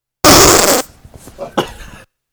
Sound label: fart